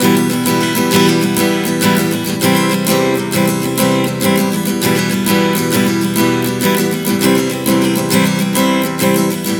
Prog 100 Em C, Acoustic Guitar, Chord Progressions 100 bpm